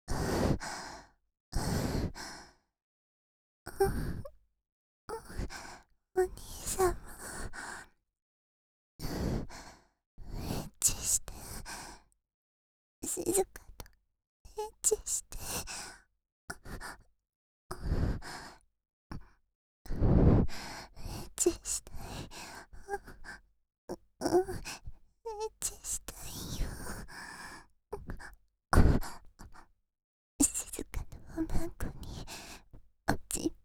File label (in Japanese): エッチしよ♪ 喘ぎ; 静華